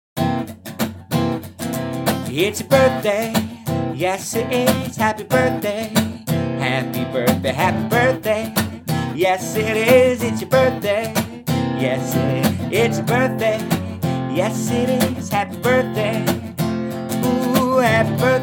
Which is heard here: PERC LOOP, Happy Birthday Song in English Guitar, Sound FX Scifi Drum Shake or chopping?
Happy Birthday Song in English Guitar